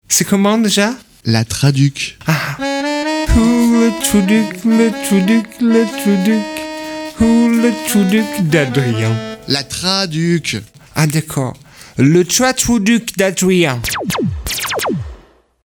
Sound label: jingle